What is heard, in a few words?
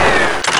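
Pulse Down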